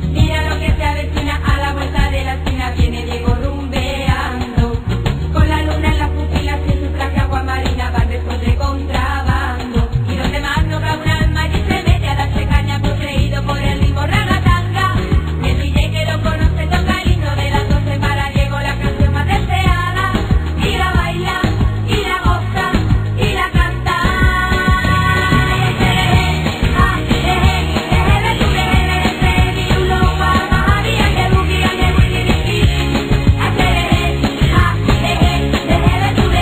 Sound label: loop